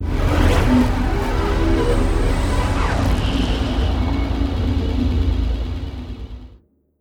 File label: event cityscape; Stellaris